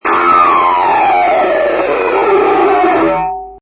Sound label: the pick slide